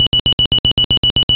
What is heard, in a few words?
bonus